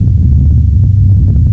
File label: shover; Empire Earth